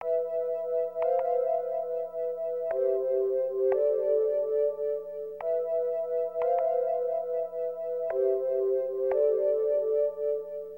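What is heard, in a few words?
synth, D, Hip Hop & RnB; ProSamples vol.34 - Hip Hop & RnB 3; Phased Out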